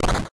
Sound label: Open; openbound